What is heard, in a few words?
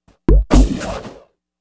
Perc FX; Sonic Foundry; Sony Creative Software; Stylus Pressure Urban Grooves on Digital Wax; Nu Tang